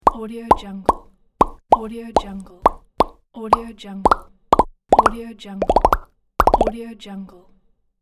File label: pops